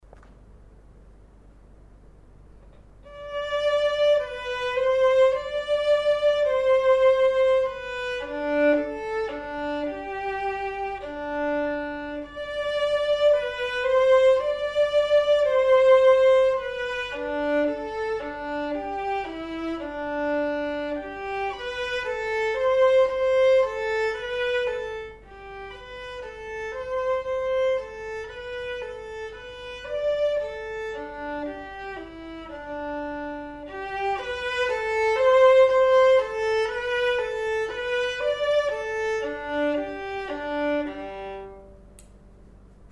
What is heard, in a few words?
perf vln